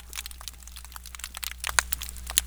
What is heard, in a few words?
Snapping Shrimp; Palma Sola